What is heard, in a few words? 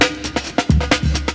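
LOOP snare drum, Drum & Bass, 130 BPM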